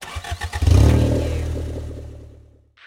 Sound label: Car Motor Start Up Engine Perspective